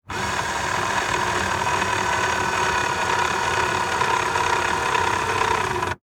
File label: Transportation Car Monte Carlo Adjust Mirror